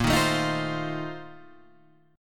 Bb Major 7th Suspended 2nd Suspended 4th, Guitar, Standard; 6,6,7,8,6,8; strummed